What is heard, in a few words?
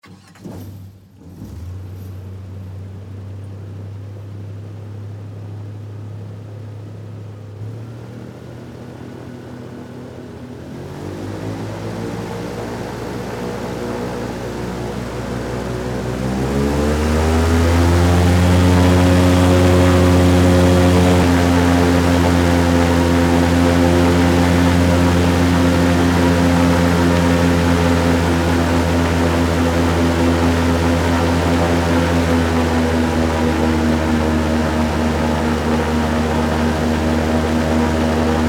Vehicle Air Boat Start Drive Shut Off; Vehicles